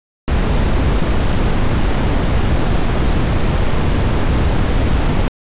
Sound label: brown noise